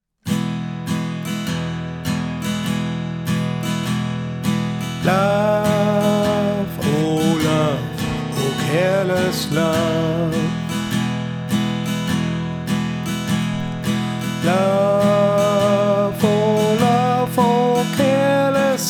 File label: Careless Love Swing